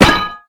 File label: bounce; gusanos promode